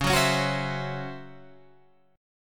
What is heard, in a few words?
Db Minor Major 7th Double Flat 5th Db Guitar Open G